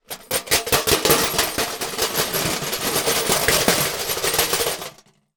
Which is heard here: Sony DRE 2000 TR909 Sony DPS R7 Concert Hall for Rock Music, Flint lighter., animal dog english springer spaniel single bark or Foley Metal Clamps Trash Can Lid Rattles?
Foley Metal Clamps Trash Can Lid Rattles